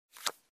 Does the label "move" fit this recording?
Yes